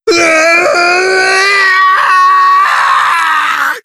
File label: battler cry; umi